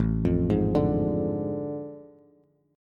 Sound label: A Major 7th Suspended 4th Sharp 5th, Bass; arpeggiated